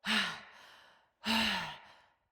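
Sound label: Cry Heavy Walk Female; Battle Barks; Envoy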